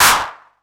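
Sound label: 808 clap; PERC TR; Wizoo - Platinum 24 Electronic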